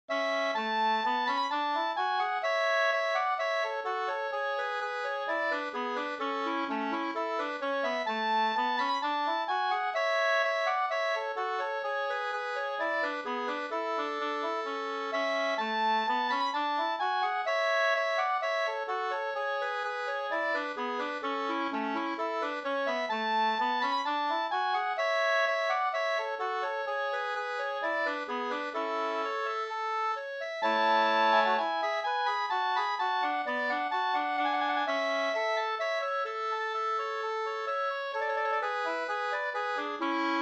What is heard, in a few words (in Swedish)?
brudmarsch; Vika brudmarsch av Brodd Albin